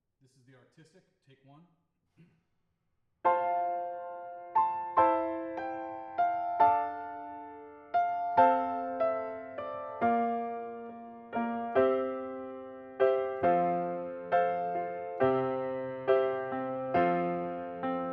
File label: Piano; ARTISTIC; mic pre shootout